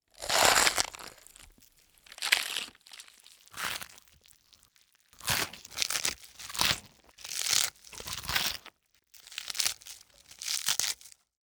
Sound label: bug eating; incredible-race